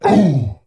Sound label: Troll Wound; Trollgore